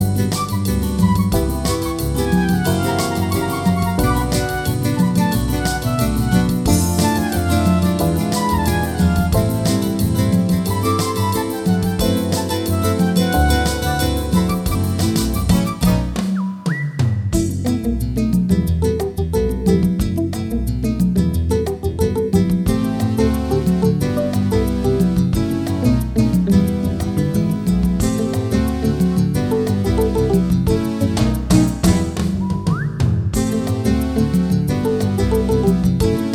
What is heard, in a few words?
salsa